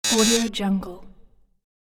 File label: wrong answer buzzer notification